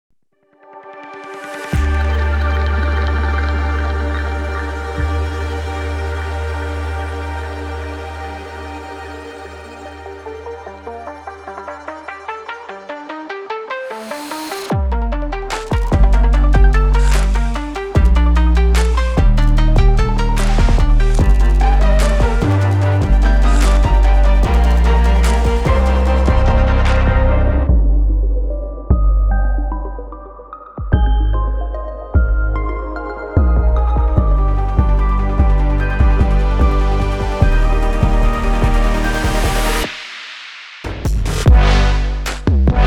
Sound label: Trailer Music